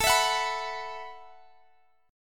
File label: B 7th Suspended 4th A